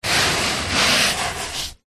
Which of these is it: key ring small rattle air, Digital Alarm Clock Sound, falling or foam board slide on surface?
foam board slide on surface